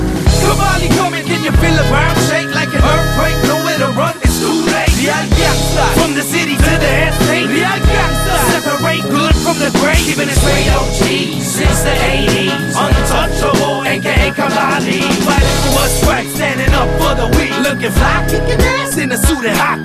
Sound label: BG Score Telugu Ringtone